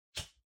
Lighter (Far-Flick)

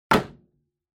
Handheld Transceiver, Put

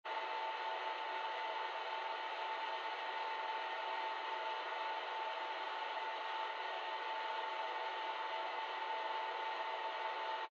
Radio Signal Noise Far AM

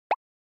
Short Accent Dry

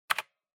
PC-Keyboard (Space-Hard)

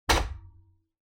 Ceiling Light Pull

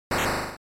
General Noise Phrase

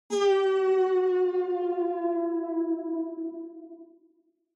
Onoma Negative; オノマトペ ネガティブ04-3; 効果音＞オノマトペ・ネガティブ

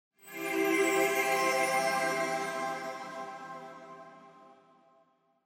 Angel Long Bell

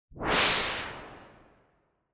Noise Sweep Long

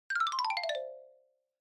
Xylophone Slow Long 1 Down

